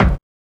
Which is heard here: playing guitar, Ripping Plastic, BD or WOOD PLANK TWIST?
BD